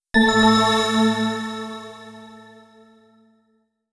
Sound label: Jingle Skill Learned